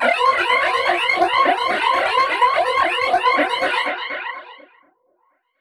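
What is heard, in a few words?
Modulation Processed, alarm siren samples 125bpm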